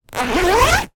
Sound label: household cushion zip; zapsplat